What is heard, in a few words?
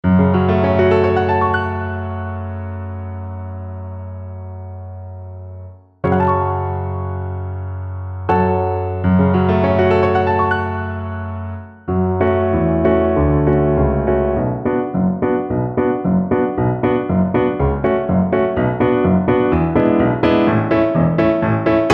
siman tov violin